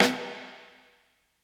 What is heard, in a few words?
80s reverb snare